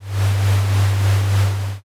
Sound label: heavy machine